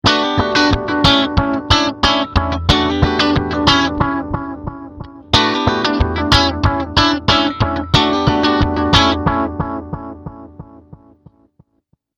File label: Clean Space